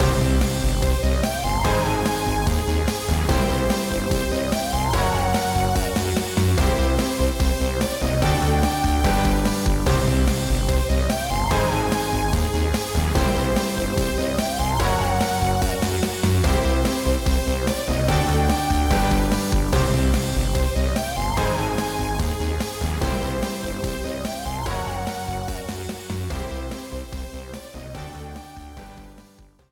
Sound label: Music Alien Conquest Victory; lego brawls gamerip 2022